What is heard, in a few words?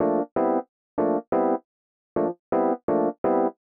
125 Rhodes A, Keys, Nu Jazz House; PowerFX - Nu Jazz House